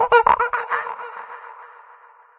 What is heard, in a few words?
Jungle Monkey; And Yet It Moves